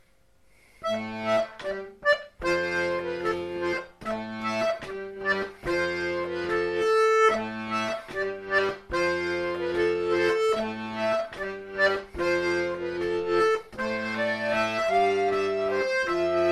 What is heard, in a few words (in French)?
Rondeau, Diato